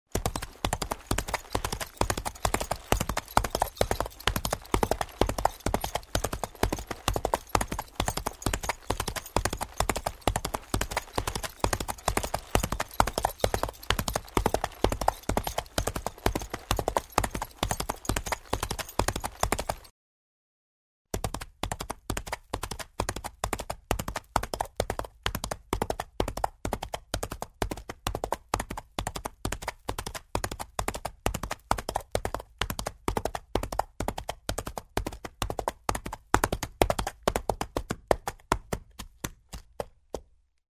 The horse gallops on the ground

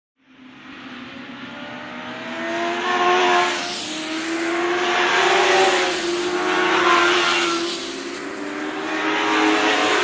Ring race motorcycles are passing by

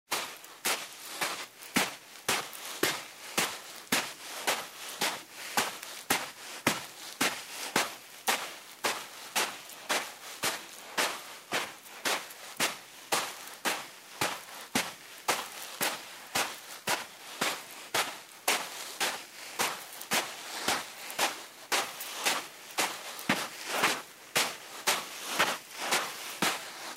Steps on dry sand in shoes

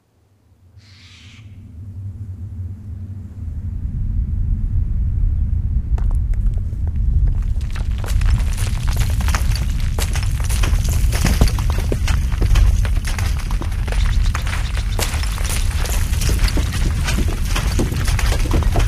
Avalanche

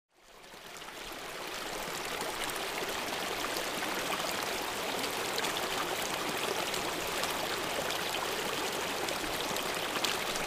Flowing stream

